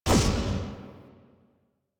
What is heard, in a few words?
Scrap Container Loop Start A; Warframe; Missions; Zariman; Zariman Assassinate